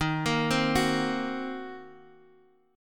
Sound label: Eb Minor 6th, down, Guitar, Standard; Ebm6 arpeggiated; JGuitar